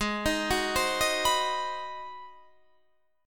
G sharp 7th Sharp 9th G sharp, down, Guitar, Standard; G#7#9; arpeggiated; capo=3